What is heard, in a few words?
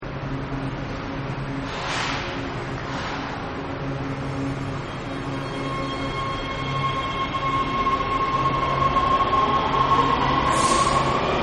Subway Revs Up Its Engine And Leaves Station-Exterior; Subway Leaving 01; Subway Sound Effects; Vehicles